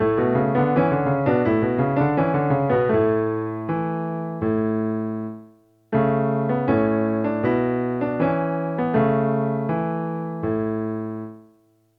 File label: dotted 8th note in A